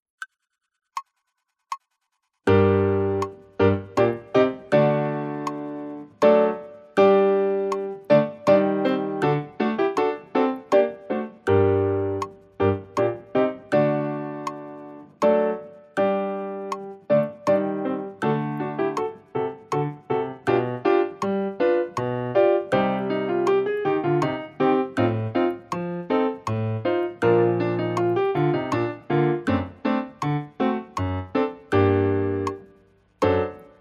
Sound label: Eccles Sonata Mvt 2 ACCOMP